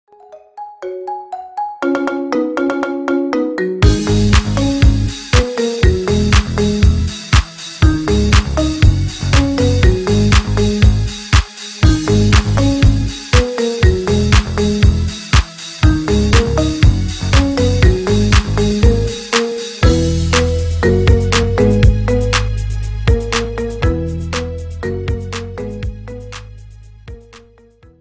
marimba remix ringtone

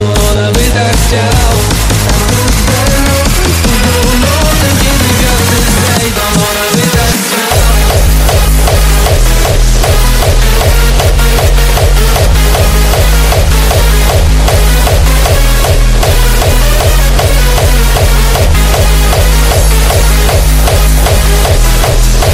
hardstyle ringtone

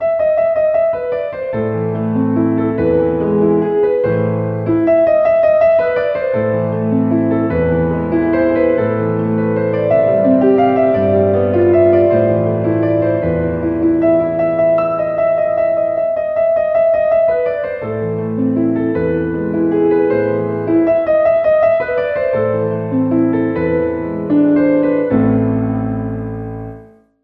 ringtone

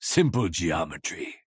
simple geometry ringtone

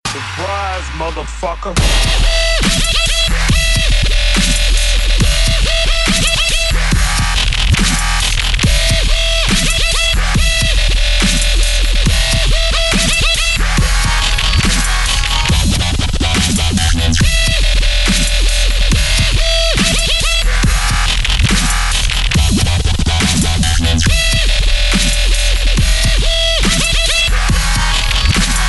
chomp ringtone

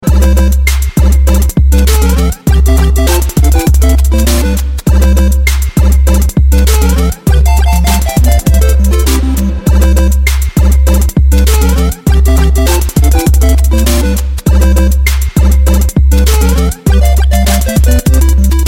trap ringtone

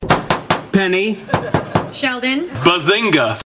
sms ringtone

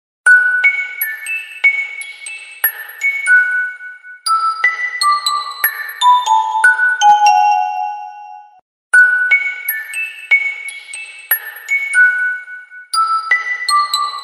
htc ringtone

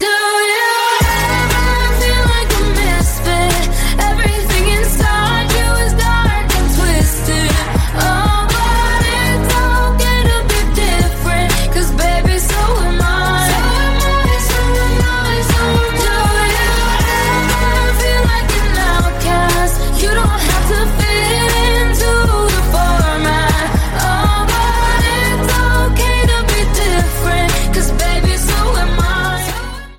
music ringtone

